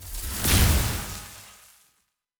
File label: Lightning Spell, Magic Sounds; Fantasy Sounds Bundle